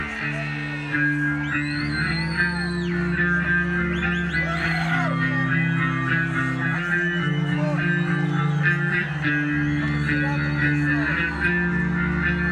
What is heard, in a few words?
Bass Solo